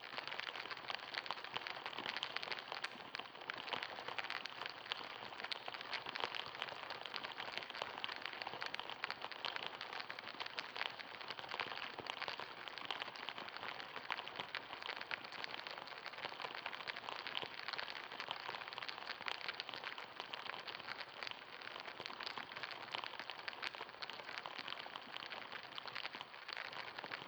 Mother Earth Sounds Egyptian Meditation Temple, Delicate Droplets